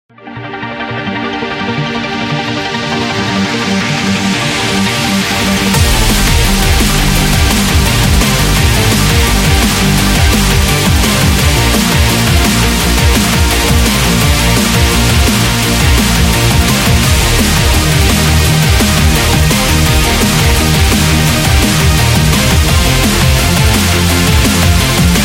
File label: Power Sport